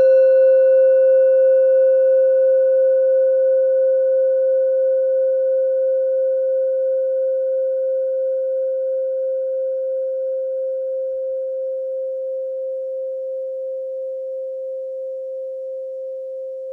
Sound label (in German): kleine klangschale